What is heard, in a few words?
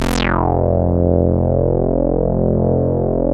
saw res dry, synth